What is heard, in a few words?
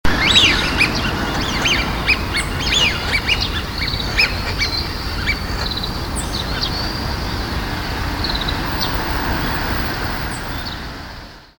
hidorigamo